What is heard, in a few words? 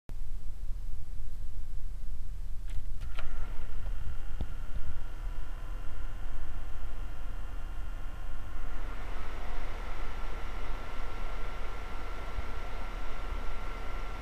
noise of centrion